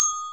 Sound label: Button, 按钮; 特酷声音素材库